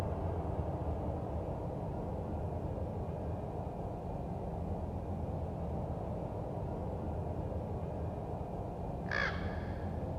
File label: Forest Atmos